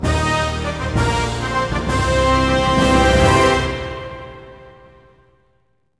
Victory Hit; ps2; Pirates The Legend of Black Kat

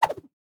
Spawnin Whoosh; Boomerang Fu

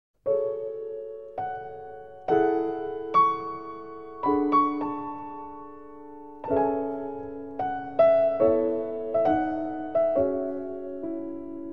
piano solo; koei the best of vol.5; nobunaga's ambition haouden; dragon

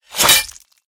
Sword Broadsword Stab

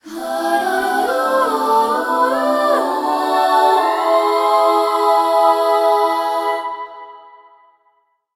Jingle Pact Forged; terra battle 2

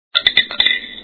SFX Slushie sound; Bin Laden Liquors

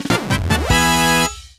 Jingle Very happy

